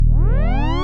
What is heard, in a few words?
biup reverse